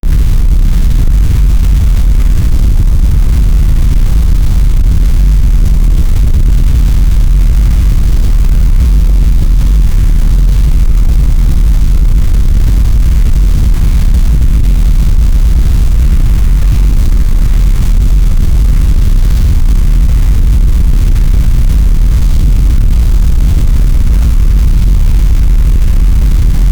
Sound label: bass, disasters